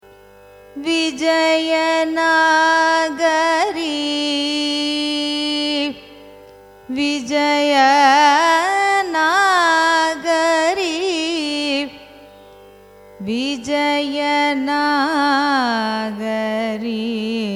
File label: raga vijayanagari signature, carnatic music